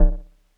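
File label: Drops C#5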